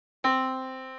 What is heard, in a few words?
Yamaha Disk, piano sound